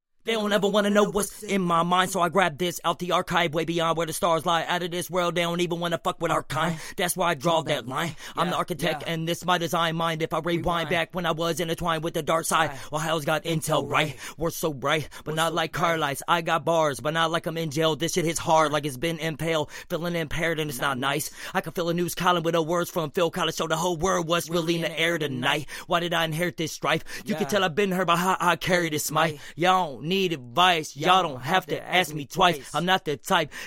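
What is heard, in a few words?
Hip Hop 130 Em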